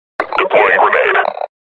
deploying grenade

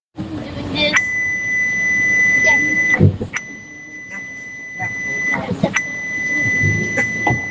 test ring

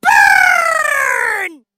roast sound

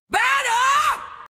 battle

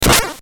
knight hurt

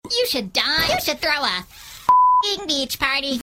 bubbles beach party

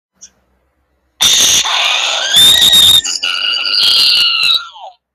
screaming guy; meme

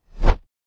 miss projectile

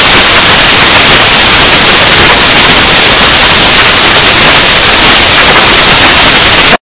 rain loud

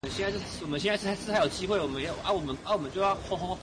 edm

